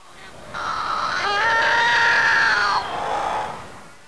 Tapejara mating call; Walking With Dinosaurs; BBC

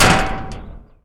Impact; Ballist; Speeders

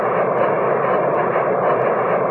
thrust; hover

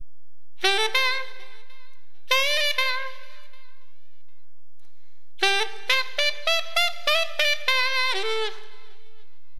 ALTO, Wind, AcidJazz Funk; LiveAct Pool 1

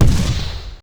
fire bomb

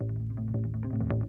drums

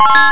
Pring

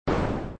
Jet Finish; space escape

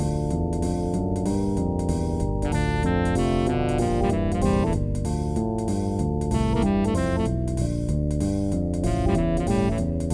Theme Loop

